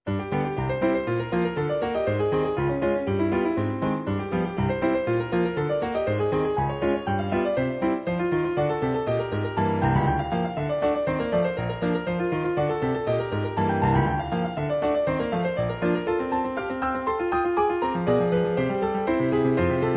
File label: Piano folk; Allegro semplicissimo